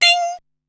"Tinn", Korg Wavestation-SR Drum Kit

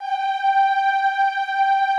EEH G4, Classical Choir; Best Service; Extended Classical Choir

